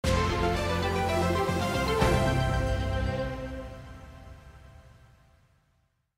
Update Sting; the lego movie 2 videogame macos ps4 switch windows xbox one gamerip 2019; Intergalactic Update Sting

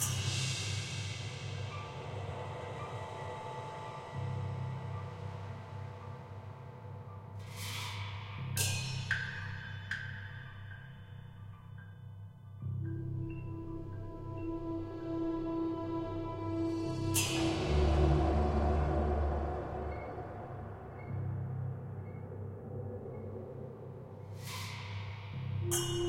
Caves Low Loop

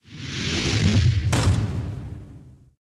UI Dragon open

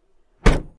Trunk Close

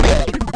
machine scream

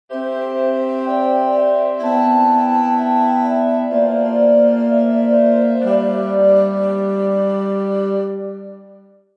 Ancient Calm; Civilization Revolution; Xbox

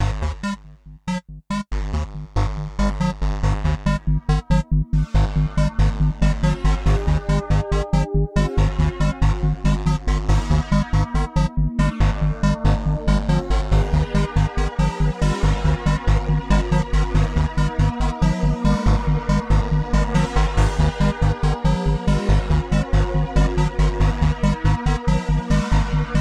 Blues; Teen Agent The Root of All Evil 2010

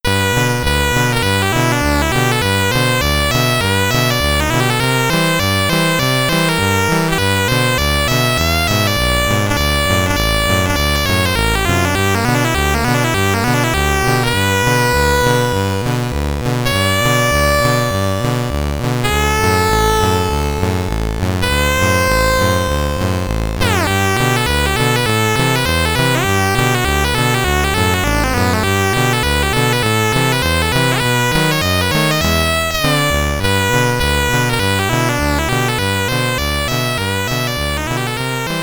BGM, SID Stereo; comic bakery commodore 64

